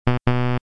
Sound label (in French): interdit; ChasseAuDragon